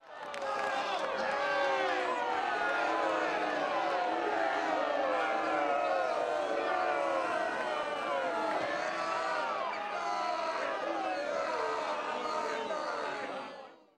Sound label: Crowd 50 Boos Yells; small int